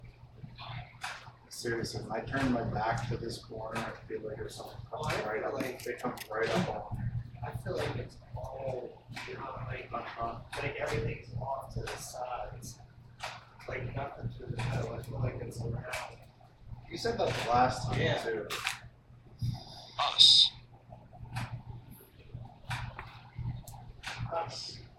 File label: Barber Shop